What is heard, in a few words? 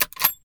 Reload; blastmorph pinball; xbox series xs